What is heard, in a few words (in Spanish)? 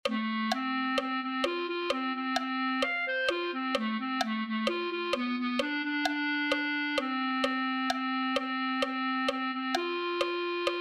clarinete